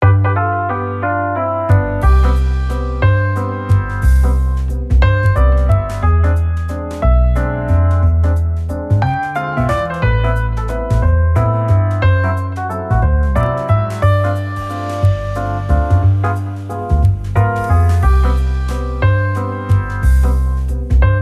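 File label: BGM CABA CASTLE; Girls RPG Cinderellife; 3DS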